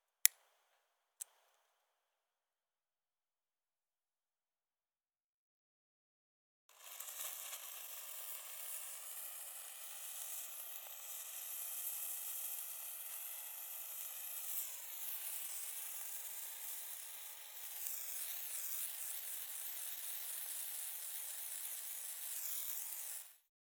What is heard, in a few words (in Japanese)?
手持ち花火（途中で火が消える）; 夏実と縁側花火デート